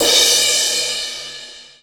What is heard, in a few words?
CRASH, drum; KITS-SMALL